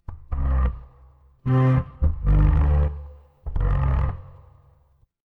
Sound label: horror creak